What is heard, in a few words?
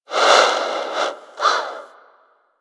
Bird Snore; Warframe; Hunting Critters; Sawgaw